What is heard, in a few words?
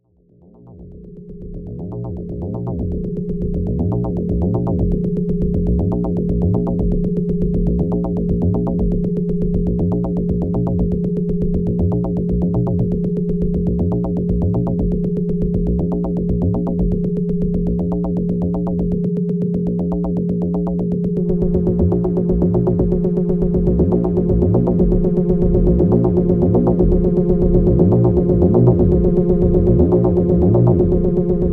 DX7 V Seq